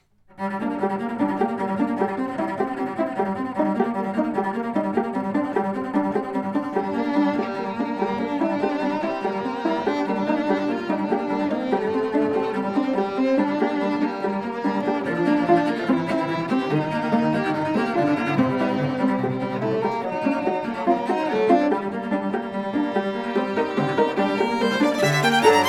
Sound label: Movement for String Quartet